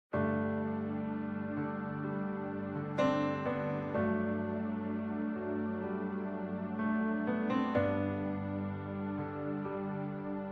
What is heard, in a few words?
Instrumental